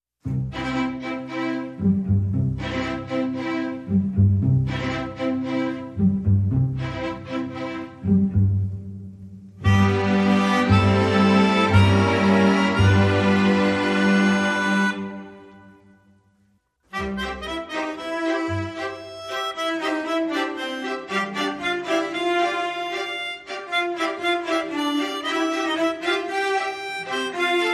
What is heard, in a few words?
No Pluck and No Percussion